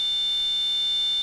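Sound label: heart monitor, hospital; ambience; Soldier Of Fortune II Double Helix; XBOX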